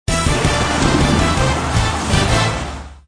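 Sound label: Free Spin Award; hockey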